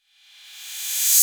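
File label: 808 cymbal reversed; Wizoo; Platinum 24 Electronic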